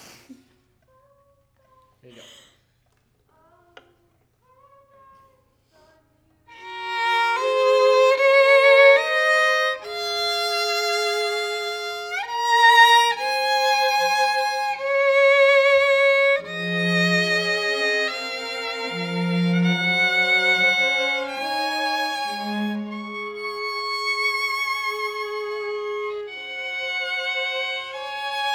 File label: violin